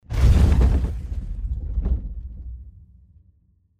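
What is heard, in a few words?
hit on the floor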